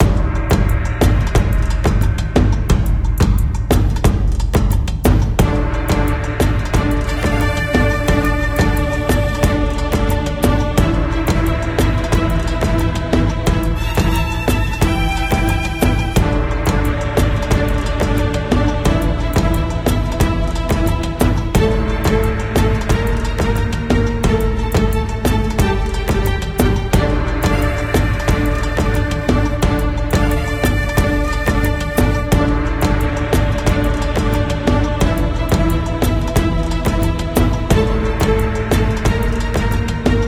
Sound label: Avatar Music loop; Nickelodeon Super Brawl Universe; Android